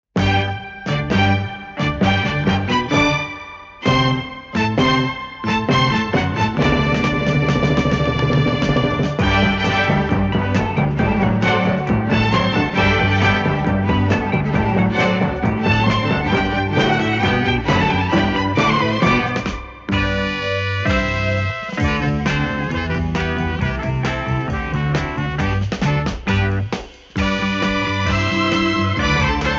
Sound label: Happy Nightmusic